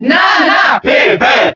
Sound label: Cheer German; Ice Climbers; SSBB